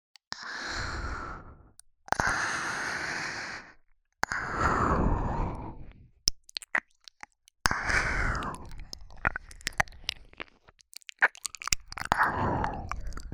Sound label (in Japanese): 耳舐め